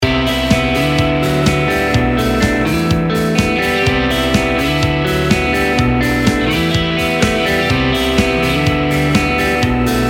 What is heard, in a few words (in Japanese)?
グレーアウトLoop～ギター疾走感焦燥感ロックエモい～; にゃるぱかBGM工房; 爽やか; nostalgic; sad; synthesizer